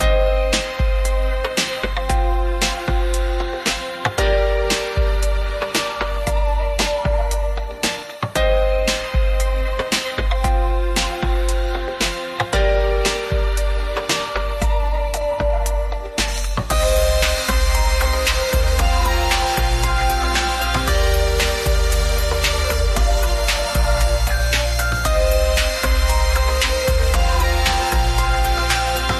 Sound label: pop